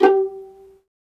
violin; ILIO; Synclavier Strings

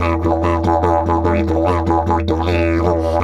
E 150, DIDGE RIFFS

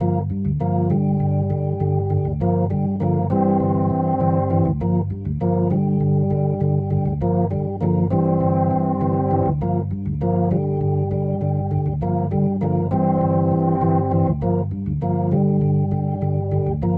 Rotor Riff, Organ

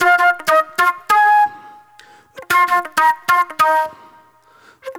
NY 70's Jazz Flute

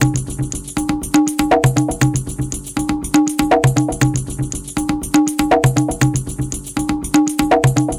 Rattler Beat; Sonic Foundry (Sony Creative Software); Myths of Technology; Composite Beats

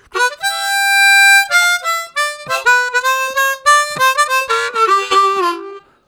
Wall, Harmonica, Riffs; Zero G

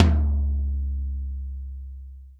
tom, Real Drum Kit, funk kit

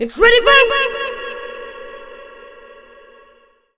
READY 4, Funky Vocals, SHOUTS; USB Soundscan vol.18

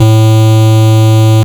more bass A3; LIMAT LSDJ kit; LSDJ Extra Bass Notes

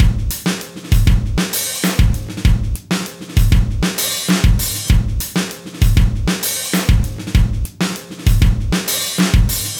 98 live funk jazz, breaks, Live Breaks

